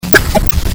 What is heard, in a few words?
teleport; slender; corruptedwoody